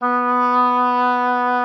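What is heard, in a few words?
B3, oboe d'amore; Xsample VOL-7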